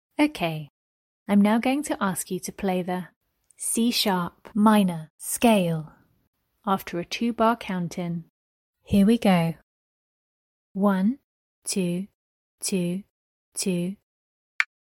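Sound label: C sharp minor scale A minor scale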